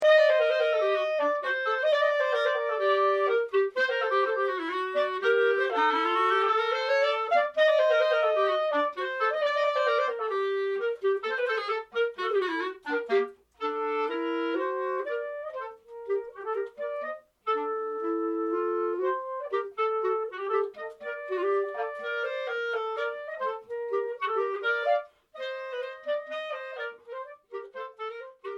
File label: tango